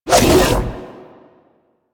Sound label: Hammer Shield Throw Whoosh B; Crp Hammer Shield